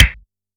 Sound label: soft hit normal; ThePro365